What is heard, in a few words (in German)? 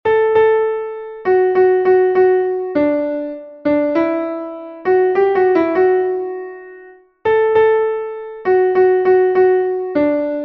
klavier; am brunnen vor dem tore; melodiemeister